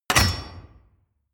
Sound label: medieval click generic confirm medium, metall; hits explosionen